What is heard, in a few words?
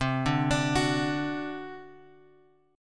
C Suspended 2nd Flat 5th C; Csus2b5 Chord {X 3 0 X 3 2} arpeggiated